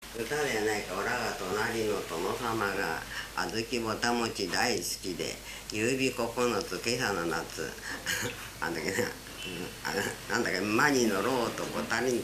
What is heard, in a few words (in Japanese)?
warabeuta